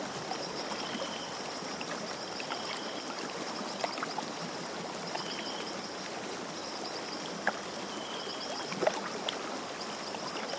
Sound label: Ambience; angry birds rio; Ambience Blossomriver